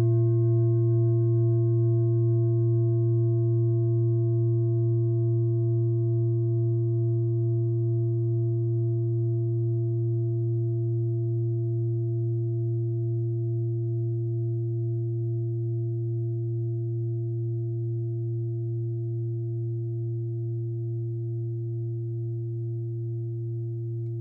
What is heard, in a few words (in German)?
klangschale set; Klangschalen-Chakraset; Gewicht = 1960g; Durchmesser = 27,2cm; Höhe = 11,0cm